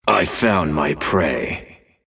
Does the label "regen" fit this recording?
Yes